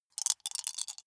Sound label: small four rattle in hand closed short; wuerfel